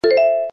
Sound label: ding